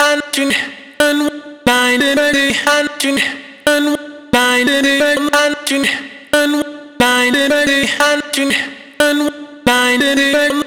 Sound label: vocal chop, 90, Bm; Fella; Slate Digital Omnivox Vocal Sample Pack; Chops